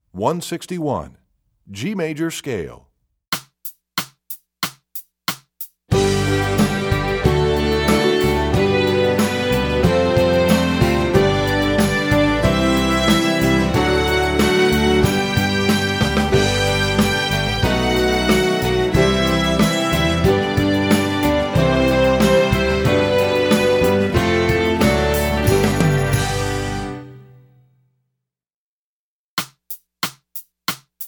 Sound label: G Major Scale, Strings; EE2000 Book1